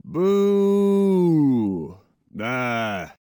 Man Booing; Zomboid; Lifestyle

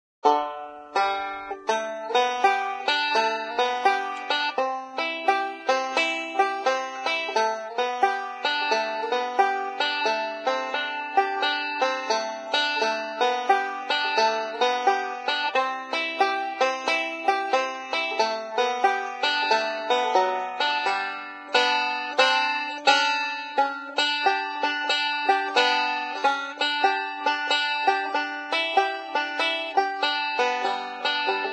Banjo; Zomboid; Lifestyle

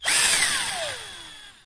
cordless drill swing; Zomboid; The Workshop

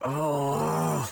Man Frustrated; Zomboid; Lifestyle

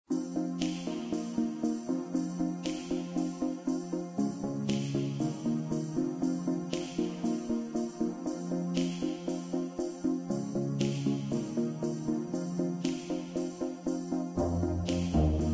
Keytar

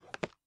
close kit; Zomboid; BetterBelts

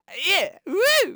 Man Dance Happy; voice; Zomboid; Lifestyle

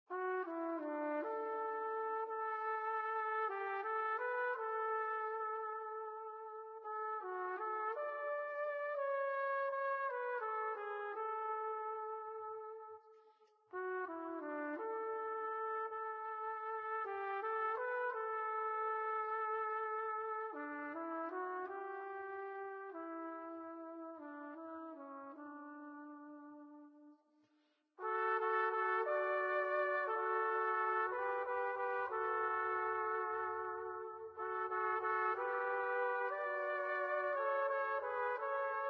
Trumpet; Zomboid; Lifestyle

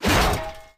Heavy; Scrap Weapons; Zomboid

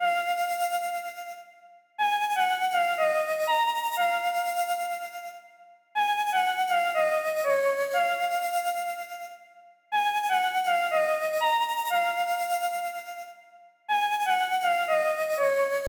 flute; Zomboid; EntertainYourself

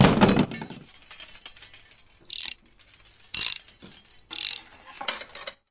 Gun Dissasembly; Zomboid; The Workshop

